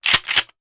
Shotgun Foley; Sound Ideas; Guns

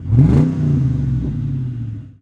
Mustang Rev; Automobiles

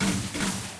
robo double; Apotheorasis

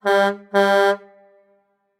Semi Truck Horn; Large Vehicles; Blastwave

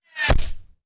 Bullet Impact; Sound Ideas; Guns

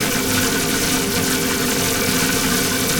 Sci-fi Electricity Hum Loop

